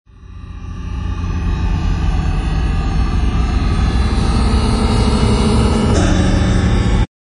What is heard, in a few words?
probe brain wave; sci-fi